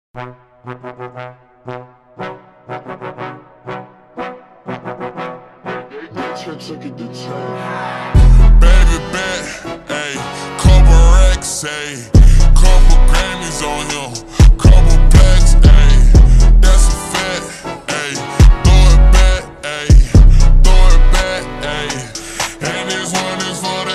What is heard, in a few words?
slowed with reverb bass boosted